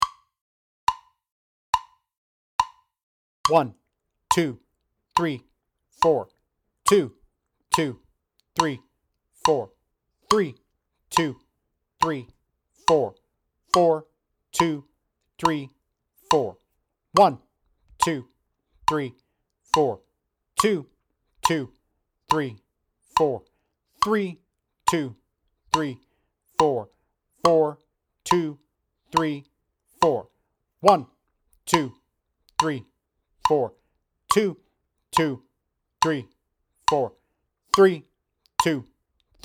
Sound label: 70 bpm, click tracks